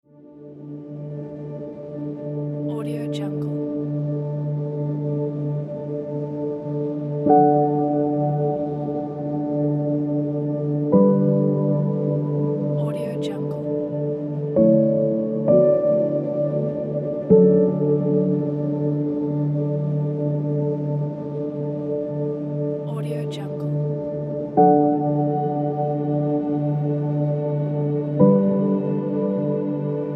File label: ambient inspiring piano